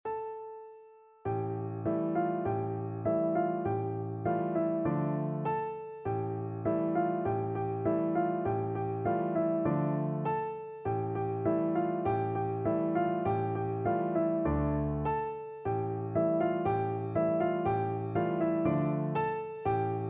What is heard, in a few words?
kalinka, piano duet